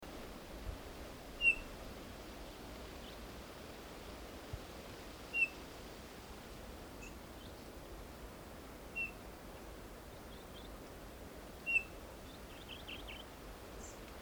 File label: Pyrrhula pyrrhula; SETE